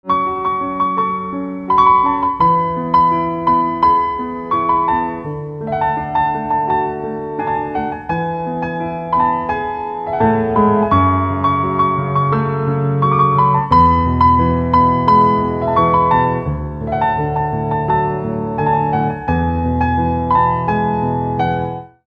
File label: Piano